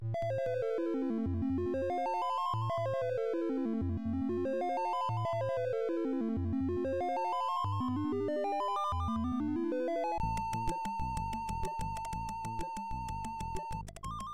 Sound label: C64 Delay; stay a while